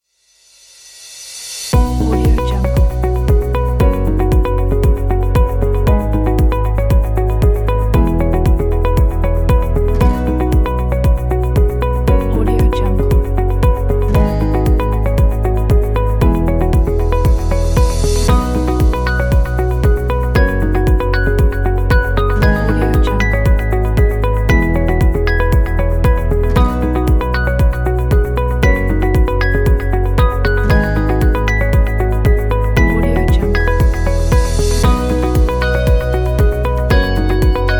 background corporate

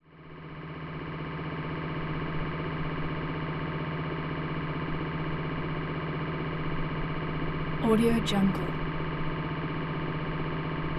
server room ambience

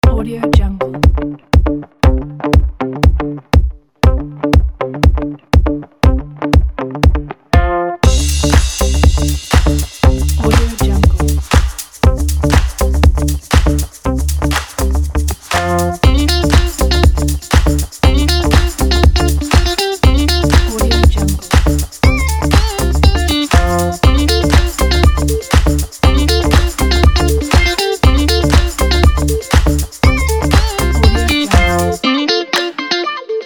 is it funk

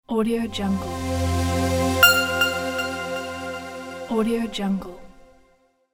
logo intro

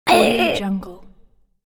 short pain critter monster voice

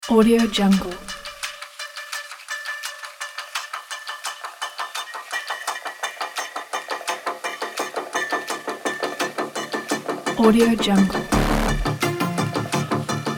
action trailer guitar for sport

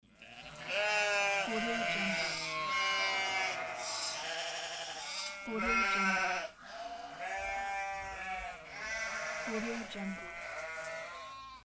sheep

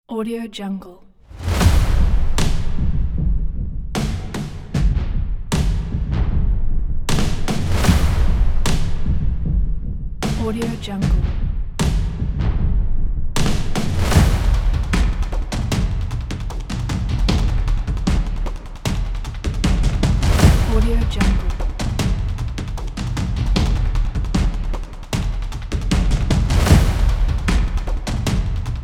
the action tribal drums

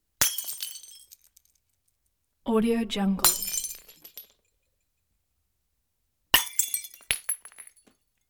juice glass smashes

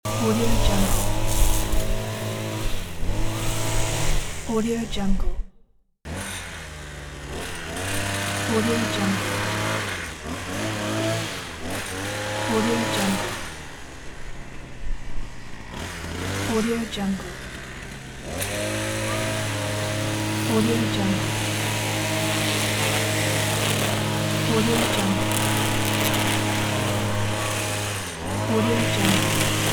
lawn mower

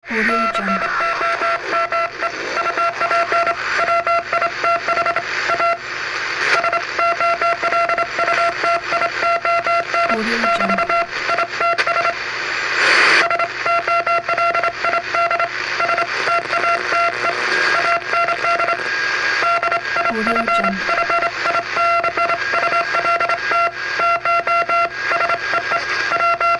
receiving morse code from short radio

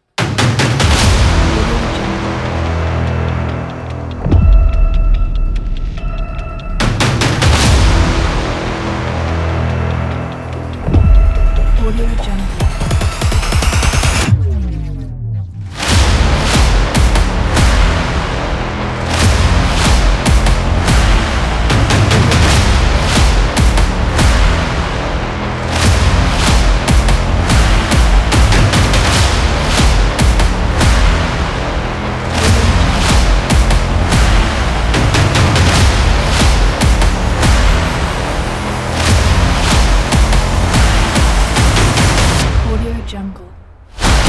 blockbuster powerfull trailer

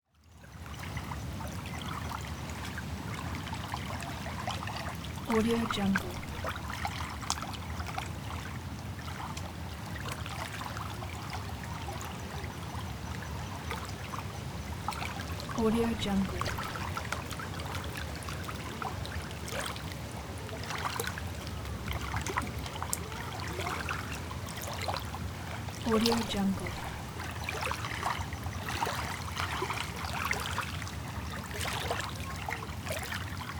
lapping water small lake waves; bestsfx

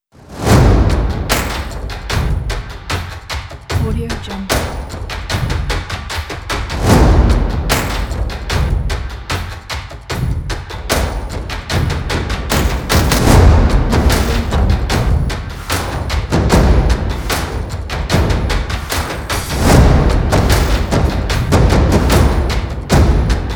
powerful percussion

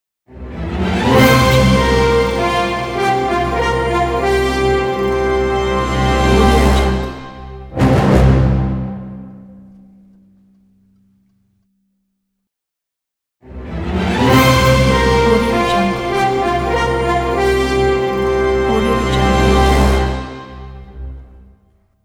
orchestral logo

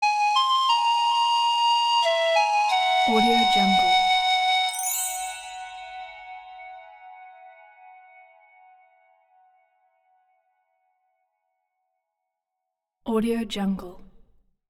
music woodwind; prosoundfx

